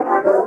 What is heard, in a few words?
C STAB; Loopmasters; Funky Underground Vol 2